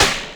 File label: Smack, Yamaha RX5 drums